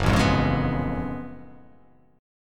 F sharp Minor Major 11th

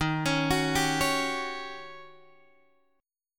Eb 7th Sharp 9th, Eb, Guitar, Open G; arpeggiated

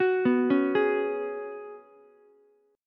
Gb Suspended 2nd Suspended 4th down Ukulele; arpeggiated; JGuitar

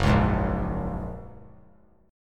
Gb Major Add 9th, down; strummed; JGuitar

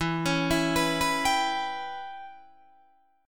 E Minor 6th guitar chord, Open D

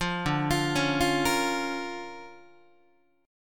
D Minor Major 7th Sharp 5th D Guitar; 8,10,8,11,9,9; DmM7#5 arpeggiated

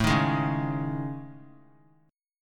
A Major 7th Flat 5th, down; AM7b5 strummed; JGuitar

